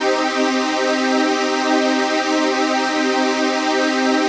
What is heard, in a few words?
CHRD PAD; Best Service; XX Large Pads; Sample CDs